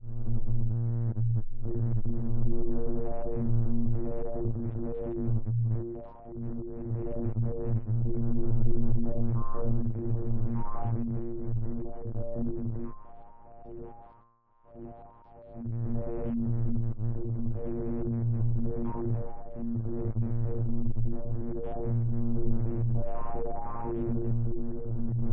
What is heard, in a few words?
Saw drone